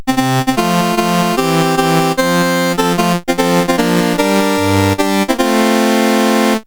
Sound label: menus, sid CSG8580R5; Games Summer Edition